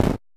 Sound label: footstep snow; Kenney's Sound Pack; Impact Sounds